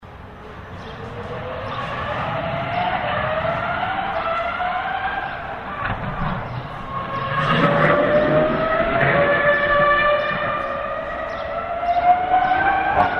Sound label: Bmw Williams F1